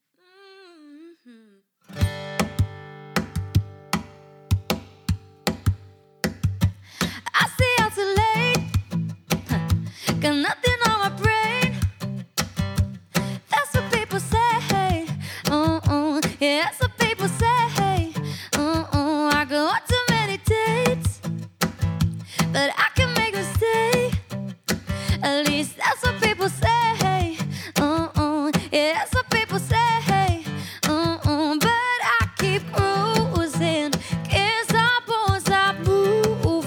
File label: Acoustic